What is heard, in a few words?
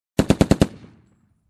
Machine gun shots